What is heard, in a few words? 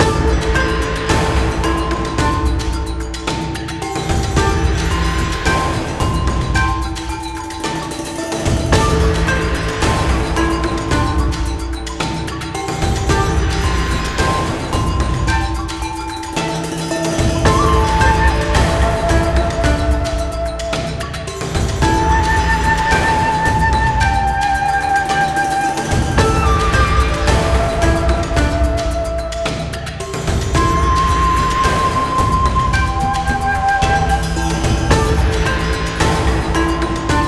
Loop